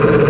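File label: idle med loop, ships engine; Quantum Redshift; XBOX; tara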